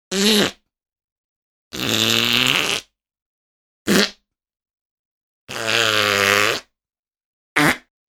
Various sounds of flatulence from a whoopee cushion; Fart (Sound Effects:Human)